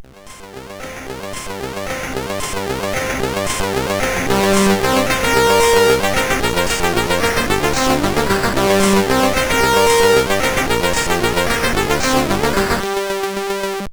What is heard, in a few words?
Crazy Sound, CSG8580; CSG8580R5; Red Software